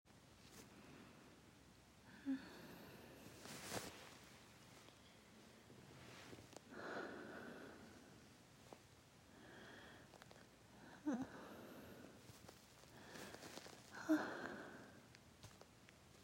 F4A, moans, bed sheet sound, too much background noise; Testing audio mini